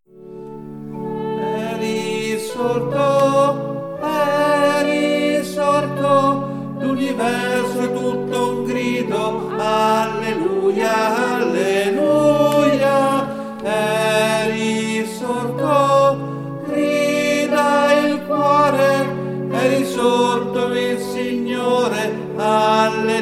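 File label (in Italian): E Risorto, tenori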